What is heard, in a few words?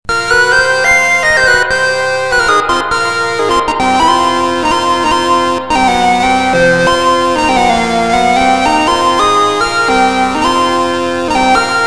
hammond; motm-730